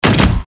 heavy metallic step

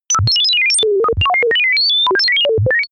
chaos sine

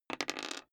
die roll table

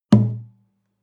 western guitar drum single tonal

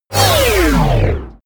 wind down

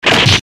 eastern fist hit seq part1

